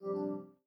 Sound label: Backpack Close; Custom UI Sounds; Playstation 4